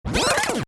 Music rewind, Rewind: Tape Effect, Fast Rewind Of Music; Tape Players (Sound Effects:Electronics); Stuart Duffield